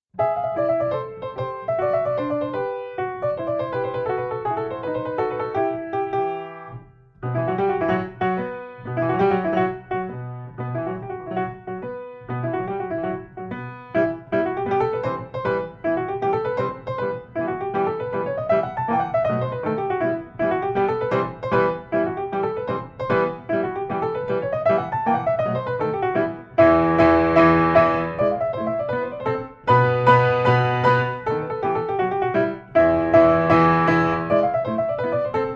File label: Tarantella; Heller